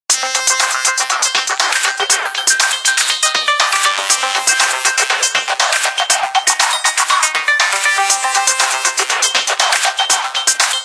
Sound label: Ring Shifter; HTC Touch Diamond